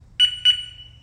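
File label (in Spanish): desactivacion, ringtones de alarmas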